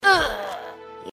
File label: ruugghh